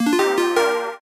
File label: basic Joker Win; mega joker